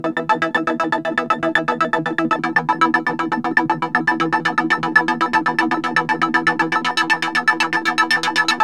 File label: SEQ WOBBLE, Trance; AMG; Now CD-ROM (Roland); G.T.M. SEQ 2